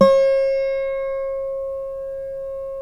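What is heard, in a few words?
GUILD 12-string, Guitars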